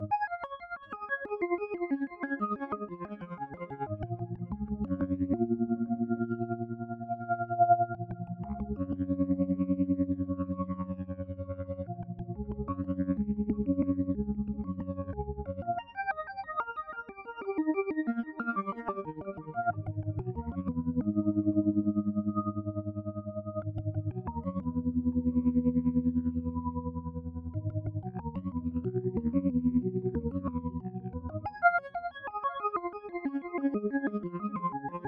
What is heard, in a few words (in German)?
TC2290 DT Orgel Swirly Chops